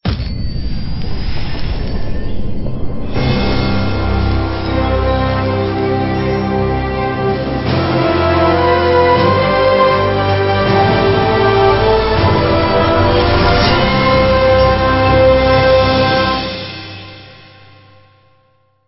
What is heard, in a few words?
Stinger; STINGERS